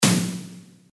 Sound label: Snare; spire trance leads